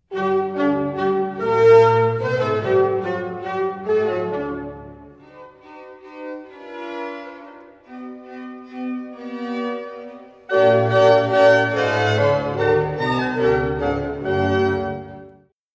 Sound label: symphony 25 in g minor menuetto; mozart; k 183